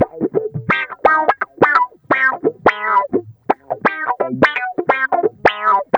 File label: GUITAR, Funky Ass Loops; East West